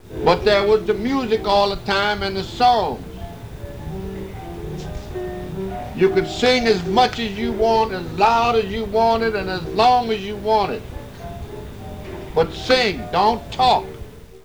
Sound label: Sing